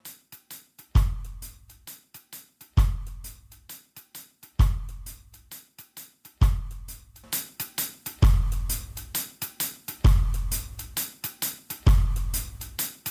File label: drums; snuff box